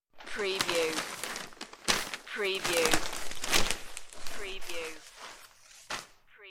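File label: Newspaper Rustle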